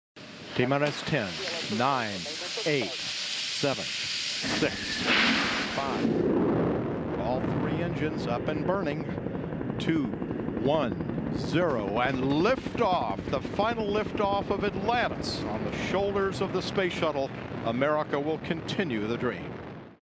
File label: ringtone launch